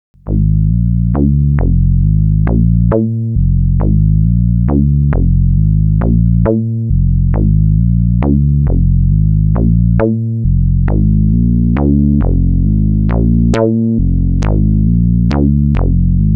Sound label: Behringer Edge